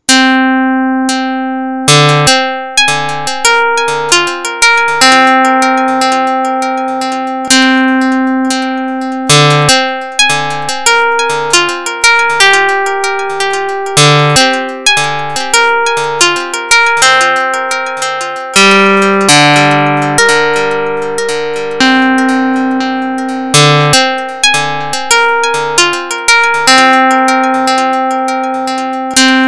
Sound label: twang